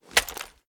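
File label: reload empty extended mag end; weapons; gmod; viper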